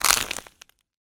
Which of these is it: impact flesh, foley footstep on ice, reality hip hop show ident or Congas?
foley footstep on ice